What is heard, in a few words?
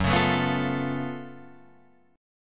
Gb Minor Major 11th Gb down Guitar Drop D